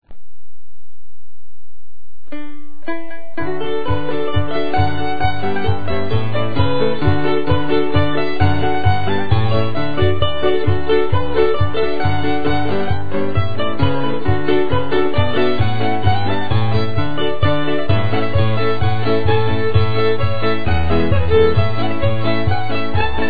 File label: polkas, barndance; ballydesmond